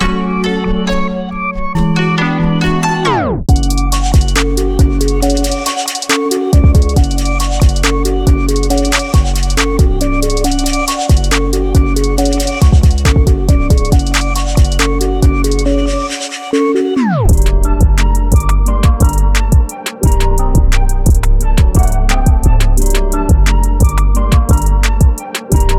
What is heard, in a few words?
ATL Trap; Essentials